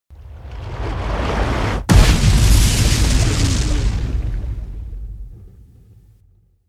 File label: ship hit